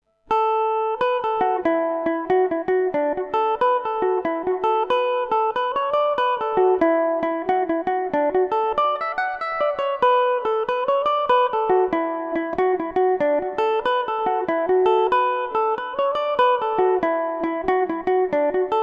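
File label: jig D major revelation, irish mandolin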